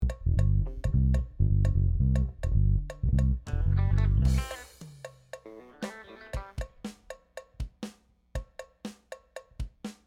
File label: Strings Mix